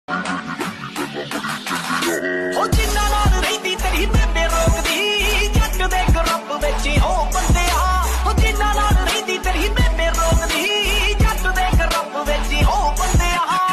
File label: new punjabi ringtone new punjabi love ringtone new punjabi sad song ringtone